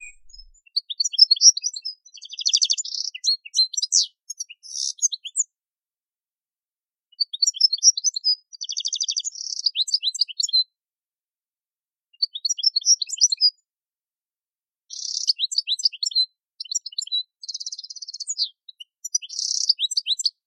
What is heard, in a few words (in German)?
Stieglitz